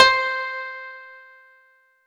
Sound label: Yamaha CS2x Koto C6